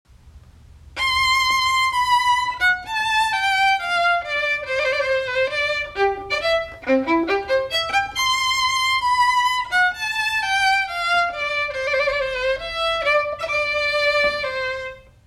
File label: Solo Violin; Just Divine String Ensembles